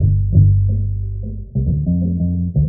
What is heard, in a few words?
bass gets down; loops; Phonky